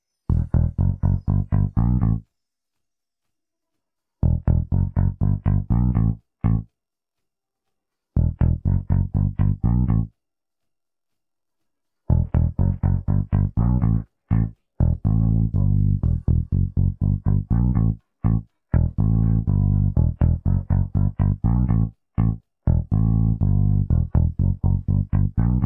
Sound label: bass